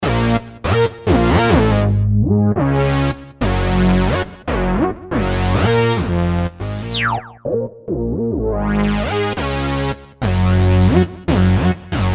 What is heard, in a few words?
synth mono; Creamware minimax